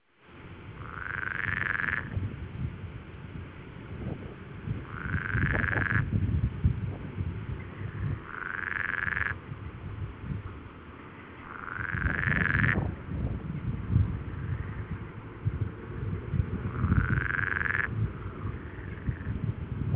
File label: shqiperica, waterfrogs